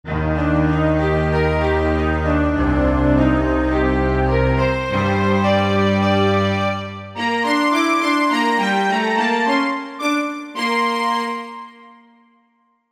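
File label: String Pizzicato, Korg MSC-1S; Korg MSC-1S Variety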